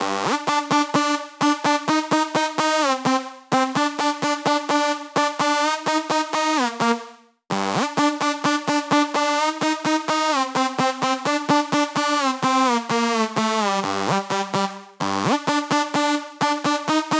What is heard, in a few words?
128BPM Synth Lead Add Dry noSC, Root G; Vengeance - Essential Clubsounds Vol.5; VEC5 Demo Constr Kits; Early Morning Kit